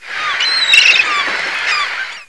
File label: ROARK; Reflux